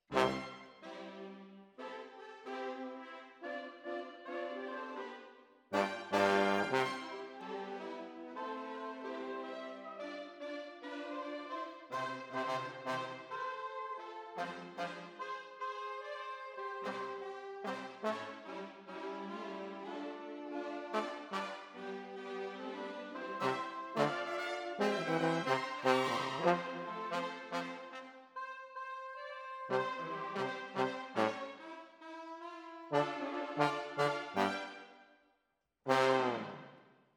Tromb ASS

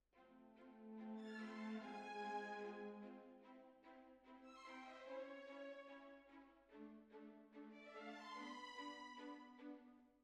Clarinet

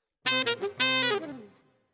Alto, RNR for piano

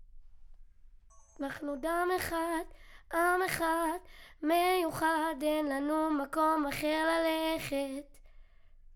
Children Choir; Studio Bekol Children Choir

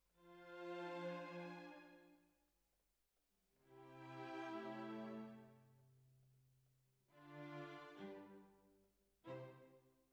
Oboe

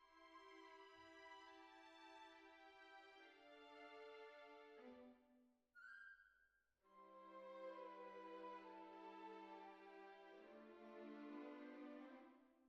Cbasses

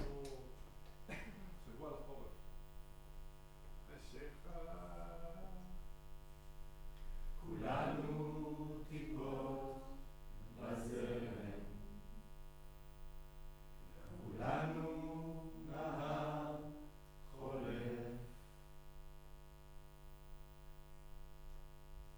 Room, Choir

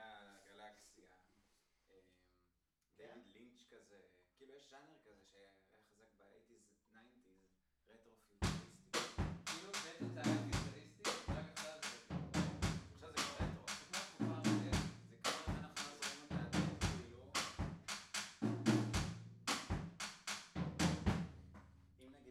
TB GTR

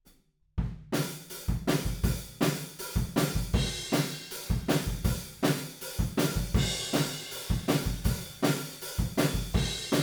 Room, 160 BPM

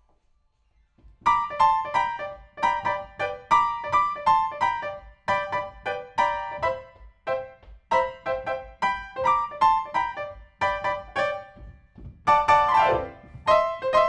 PIANO, RNR for piano

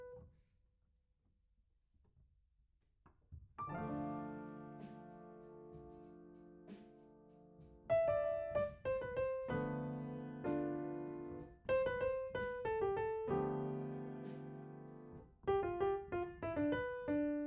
Piano A

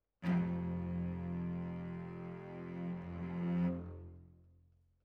Cellos Over

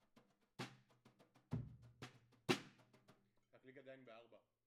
Kit, 96bpm

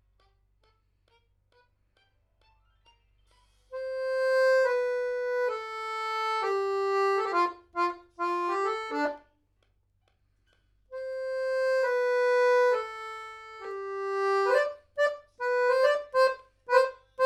Accordeon

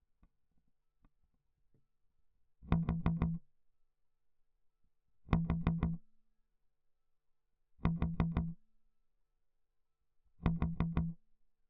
Bass Mute

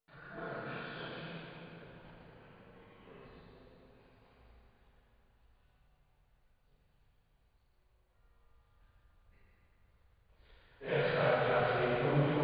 Choir

